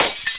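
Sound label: glass break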